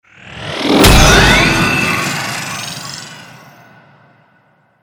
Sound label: Regeneration; Oberon